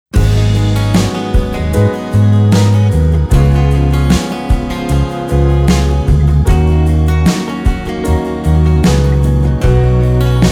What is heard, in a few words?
INSTRUMENTAL